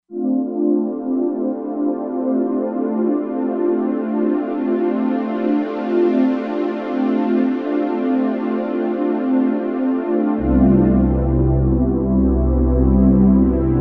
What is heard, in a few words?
Nightchoir